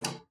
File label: PLOK G#5, SPINET; Xsample VOL-7